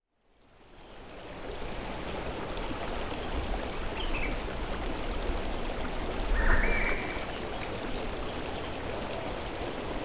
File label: Gentle Melody Stream Relax